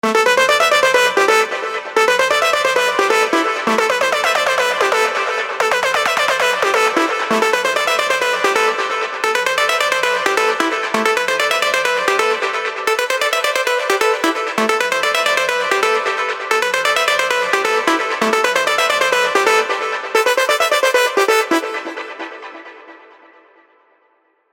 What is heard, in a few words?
LD Dirty; Vengeance Sound Avenger 2; Factory 2